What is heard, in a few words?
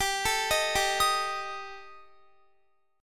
G Suspended 2nd Sharp 5th, Guitar; Gsus2#5; arpeggiated; x,22,19,20,19,22